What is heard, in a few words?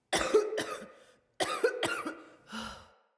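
Cough Walk Female; Battle Barks; Envoy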